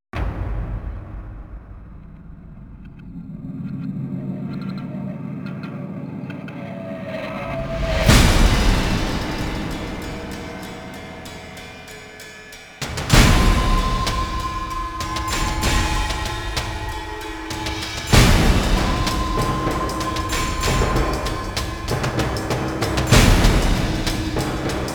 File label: percussion only